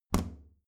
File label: plastic trash can close regular